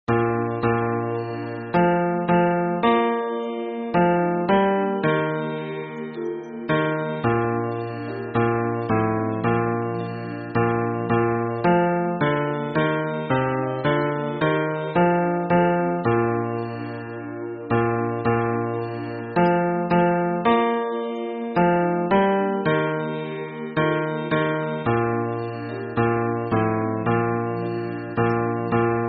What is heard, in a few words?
hymn bass